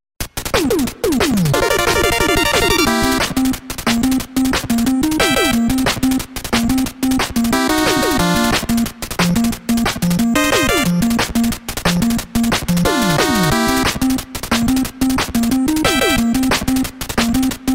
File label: mmsoundtrack; Mega Man 2; gamesoundopedia